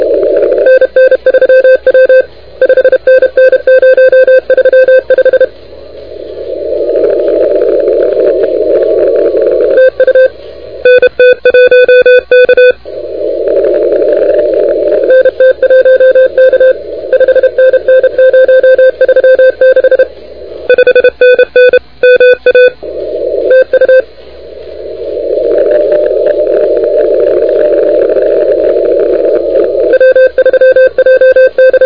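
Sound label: continuous wave 28MHz; ARRL10M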